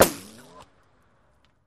Bullet Ricochet Sin

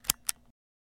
Cassette Play Mini, Cassette Player

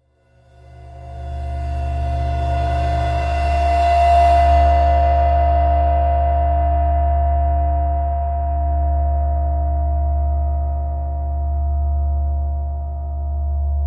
Ride Cymbal Bow, cymbal & gong; Old Ride Cymbol Bow CU 2

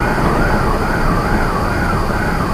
Fan Antique Ceiling Slow Loop; Fans Big

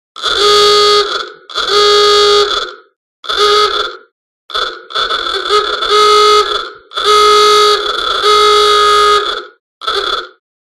Horn 1927 Chevrolet

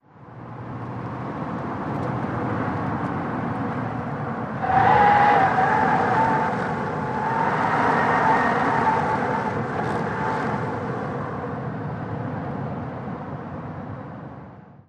Car Cornering, compact

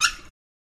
Faucet Squeak Turni, water

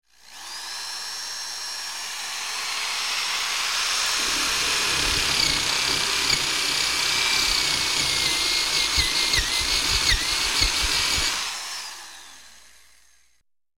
Metal Drill In Out, air tool

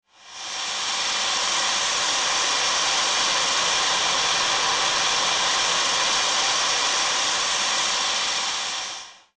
Steam, releases

